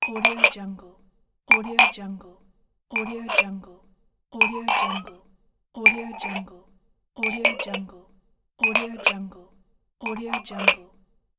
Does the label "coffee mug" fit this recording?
Yes